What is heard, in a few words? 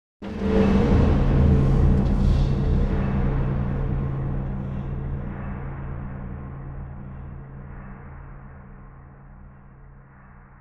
Impact Long Echo Wooden Industrial Hit; Hollywood Edge; sound designer's toolkit v2